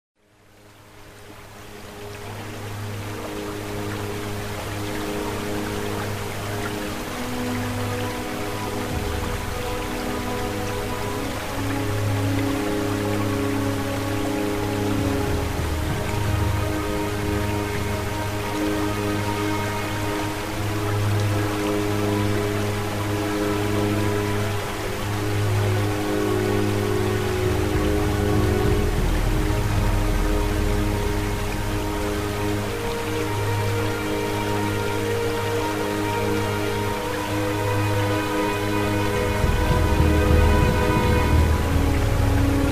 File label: white noise meditation 5 Hz theta